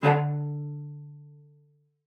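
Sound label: cello spiccato D5, cello; Xsample VOL-5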